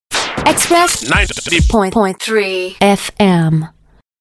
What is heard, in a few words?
jingle